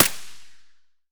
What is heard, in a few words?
ak74 silencer distant, weapons; STALKER; IWP